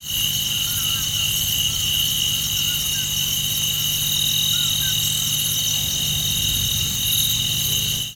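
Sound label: Cicadas Crickets Loud Night; Cicadas Crix Loud Nig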